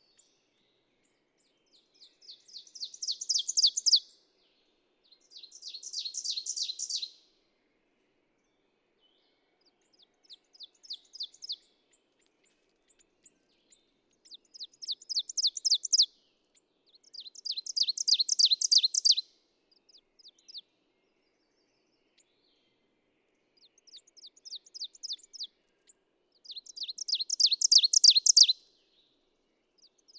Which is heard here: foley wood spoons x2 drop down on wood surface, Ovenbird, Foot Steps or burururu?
Ovenbird